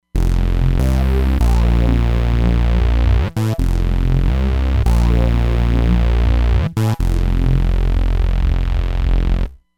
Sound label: Drop Bass, Roland Fantom XR